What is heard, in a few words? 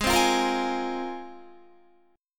G sharp 6th Add 9th, Guitar; G#6add9; strummed